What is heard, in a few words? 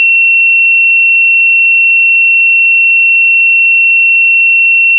2716hz sine